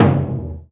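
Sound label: LOUD DRM, loud drum; Spectrasonics; Heart of Africa 1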